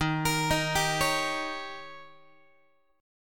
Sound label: D sharp 7th D sharp, Guitar 4A,4E,5A,5D,5G sharp,6C sharp; arpeggiated; JGuitar